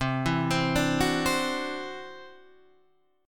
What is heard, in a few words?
E 7th Suspended 2nd Sharp 5th C, chord